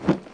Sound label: tab radio button selected; Мини Гольф